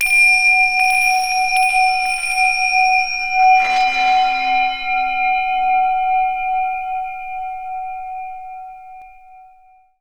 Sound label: zen tingsha